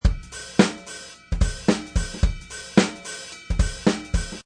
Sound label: Drum Beat